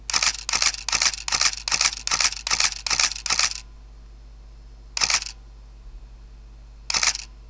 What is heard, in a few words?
continous, Nikon D50